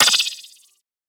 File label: perc tweety; one shots; SEISMIC Drum Sample Pack; Slate